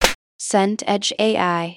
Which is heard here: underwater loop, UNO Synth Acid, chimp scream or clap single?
clap single